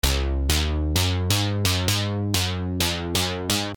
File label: BASS 808